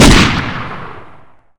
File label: rifle fire